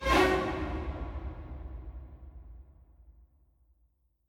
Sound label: Mus Multi Jingle; Chronicles of Riddick Assault on Dark Athena